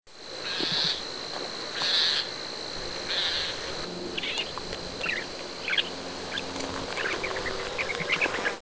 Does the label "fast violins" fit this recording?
No